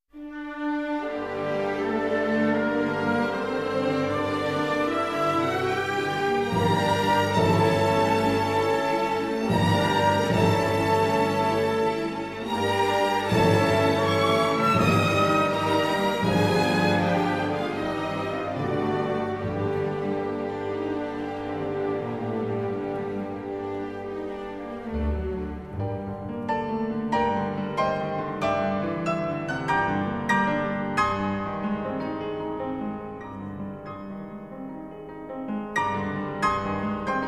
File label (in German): Klavierkonzert Allegro moderato